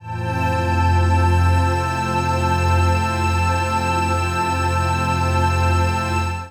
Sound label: Pad